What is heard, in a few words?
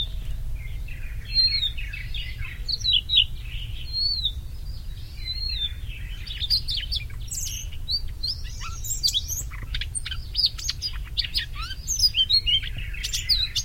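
solovey krasnosheyka voice, Siberian rubythroat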